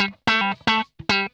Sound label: PLUK, Funk Guitar; 90 s Sample CDs; Zero G